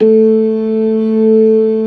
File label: ORGAN, LOWER MANUAL; Keith Emerson Signature Series